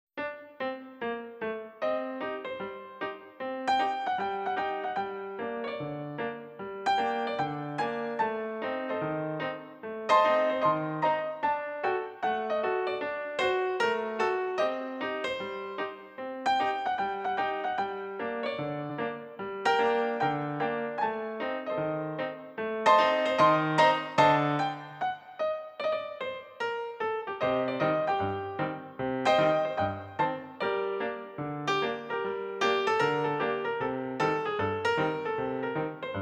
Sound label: Ragtime piano